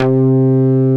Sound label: MOOGY BLUES, BASSOUNDS; Masterbits; Dance Trax